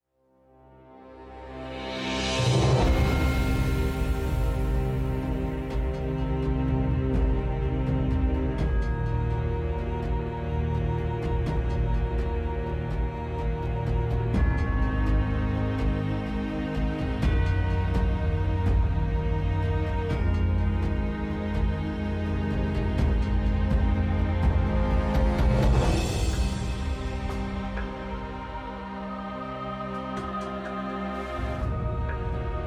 defeat; crystalscar; lol

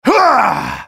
spellcast effort; Talon; en US

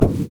wraith basic attack; LoL; monsters

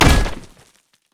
basicattack; pantheon

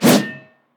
darius basic attack

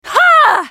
spell cast effort, en US; Riven

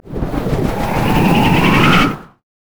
howlinggale charge; LoL; janna

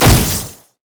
virulent slash charged; LoL; skarner

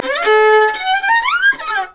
emote taunt; LoL; twitch

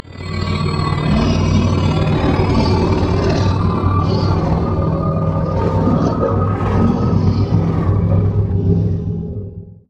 savagery loop; rengar

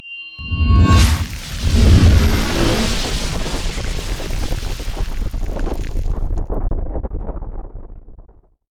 drain; LoL; fiddlesticks

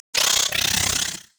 young lizard angry; LoL; monsters

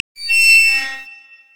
brazier chains groan

